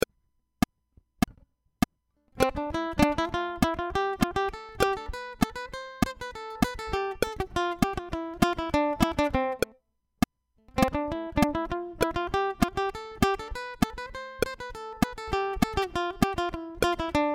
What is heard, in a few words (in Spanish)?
C Escala Tresillo Back Click